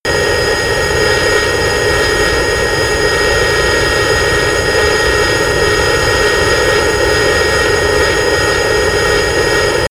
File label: plane bombardier private turbine full power close